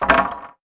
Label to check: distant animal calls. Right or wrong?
Wrong